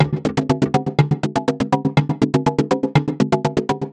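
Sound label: 122 BPM Sequence C major A minor Tonic D; innovative sequences 1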